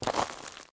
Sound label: FOOTSTEP Walk Trainers Compact Gravel Slow; mono